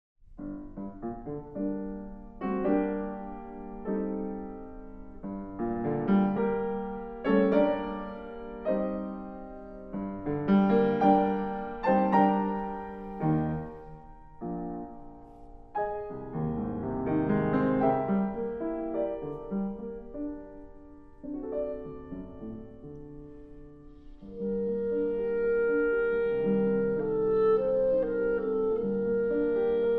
Clarinet, Viola & Piano, Nachtgesang Andante con moto